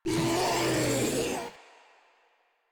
Infested Ancient Vocal Attack

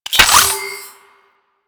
Nav Coordinate Pickup; UI; Pickups; Warframe

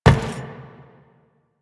Step Metal, Footsteps; Enemies; Warframe; Teshin; DE

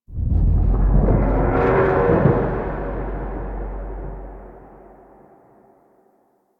Dragon Passby Death Stinger; Mainland; Ambience; Duviri; Warframe

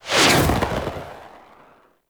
Blind Arrow Whiz By; Fey; Saboteur

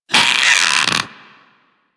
Infested Splitter Top Vocal Pain; Warframe; Grey Strain

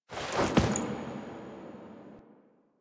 Drifter Foley B; Warframe; Quests; The New War; Sealab; Hunhows Lair; Hunhows Lair Part One

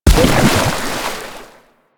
Mech Step Surface Water; Step Surfaces; Nechro

